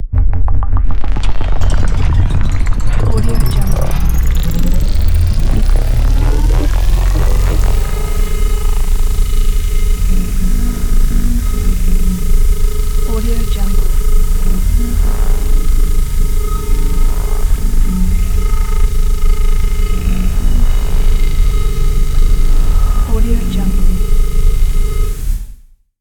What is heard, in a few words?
engines talking engine motor start and ru; Robotic Lifeforms 2